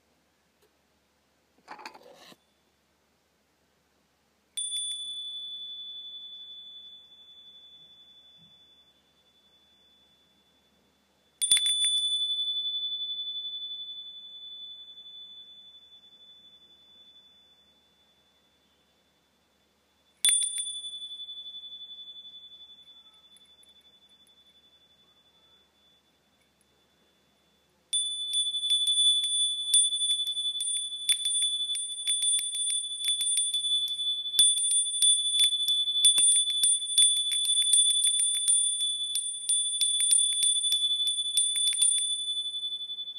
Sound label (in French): Cloche Japonaise